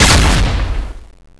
shot hit; svencontra2

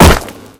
damage stone; svencoop; gruntsparty; rockstage

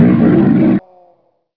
die; svencoop; legends

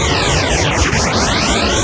pulse machine, weapons; svencoop; legends

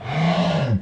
gonome moan; svencoop; lostmaze

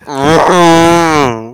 cow pain; svencoop; cow tycoon; gonome replace

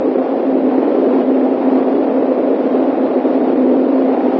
wind loop; svencoop; hc2

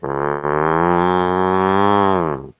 cow idle; svencoop; cow tycoon; gonome replace

